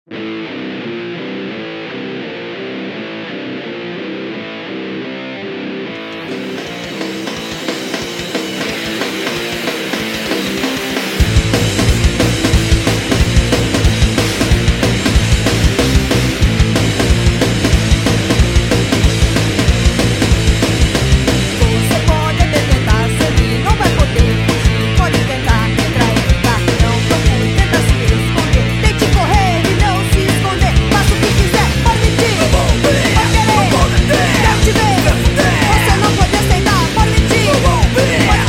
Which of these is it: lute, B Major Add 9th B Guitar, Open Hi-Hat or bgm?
lute